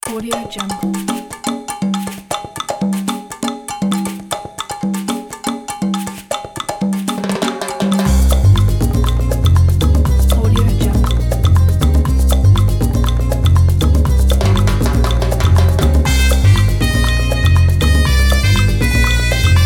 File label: latin cuban